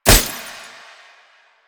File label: Tank Weakpoint Impact; Enemies; Warframe; Lasrian